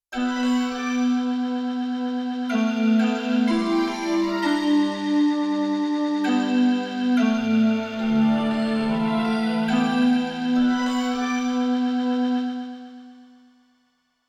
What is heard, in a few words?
Synthetic Atmosphere; Europop Performance 1